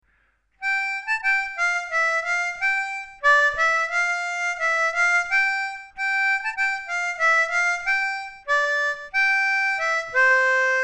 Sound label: London Bridge, Junior harmonica; In Sweden a harmonica is called a Munspel